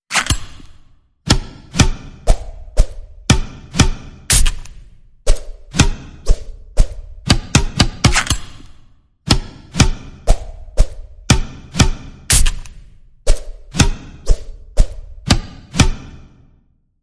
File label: Net Mastery Rhythm